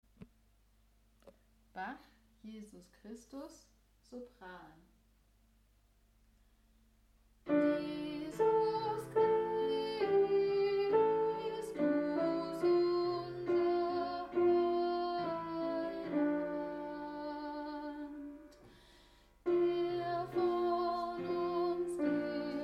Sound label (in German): Bach Jesus Christus Sopran; Reformationstag 2025 Kantorei; Übetracks Vergnügt, erlöst, befreit 2025